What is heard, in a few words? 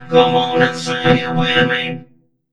Funky Vocals; USB Soundscan vol.18; AKAI